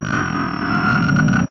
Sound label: Music Devourer Crack; The Sinking City